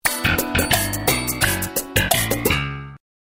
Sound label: Sitcom Bass Riff; The Sitcom Simulator Motion Activated TV Jingles